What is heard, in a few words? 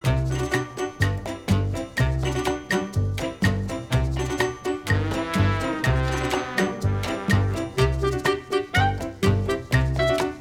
Latin Arrangement